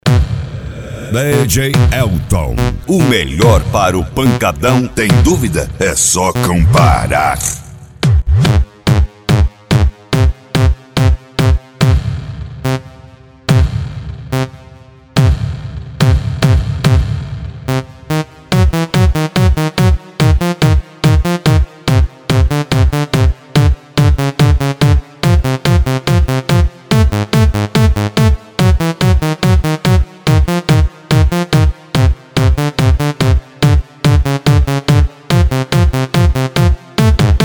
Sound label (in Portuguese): eletro car automotivo